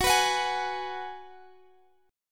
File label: G sharp Minor 7th F sharp